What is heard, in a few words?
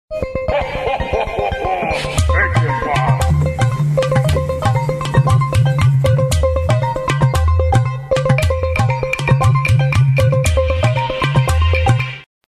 Ring Tone